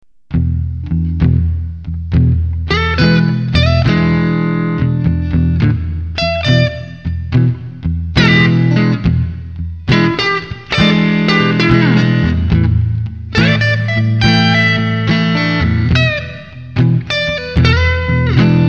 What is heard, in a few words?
sample vamp clean